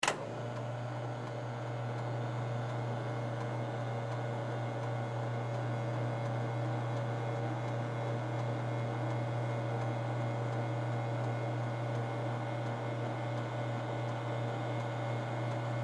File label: Police Light Bar Lights Spin Click Motor Hum Power On Off; Emergency